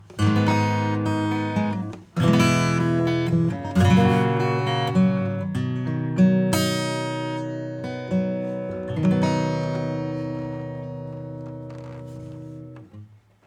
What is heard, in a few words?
Acoustic